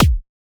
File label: Kick